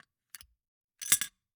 Gumball machine, candy door, plastic, open, drop, hyper; Eiravaein Works; Vaeyan IV